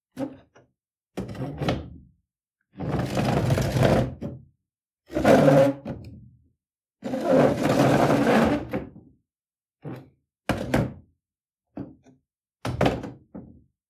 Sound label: Chair Dragged On The Ground